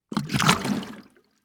Liquid Water Water Jug Bubbles In Bucket Of Water Short